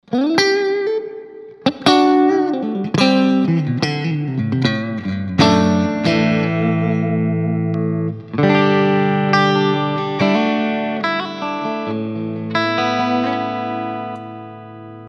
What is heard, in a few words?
jazzy